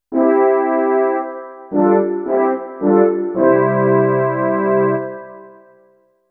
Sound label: Entering Town Jingle; The Elder Scrolls Arena